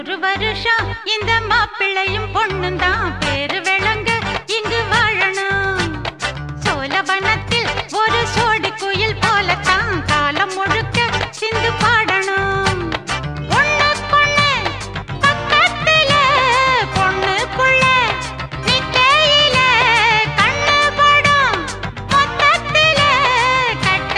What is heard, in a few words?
Female Ringtone